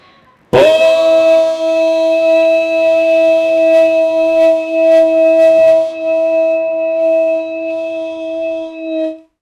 a long blow of the, instrument

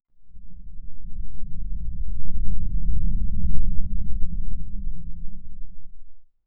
a clean soft hum mixed

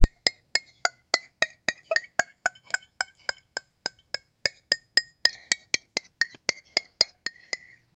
soft group laughter; gathering

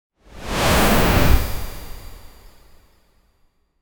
cinematic dash sound effe, magical shimmer

